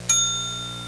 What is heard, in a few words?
HOTEL BELL